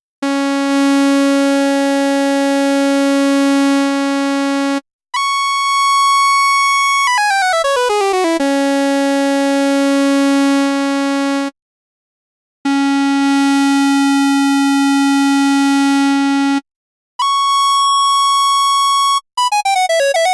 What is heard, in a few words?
Moog Sub25 Drive Saw Sqr Mix